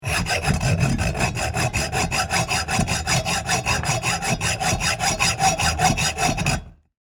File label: Industry, Hack, Saw, Into, Anodized, Steel, Pipe; Saws